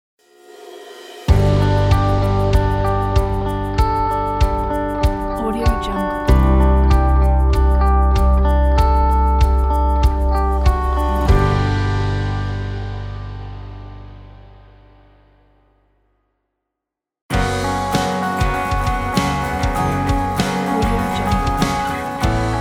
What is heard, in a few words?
gentle acoustic folk logo pack